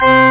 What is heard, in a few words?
Pipe Organ; synth